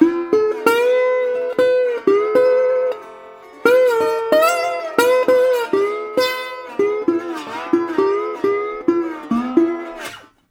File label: Blues Guitar Samples; ILIO - Fretworks